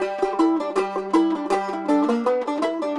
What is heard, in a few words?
80 G Resonator Mandolin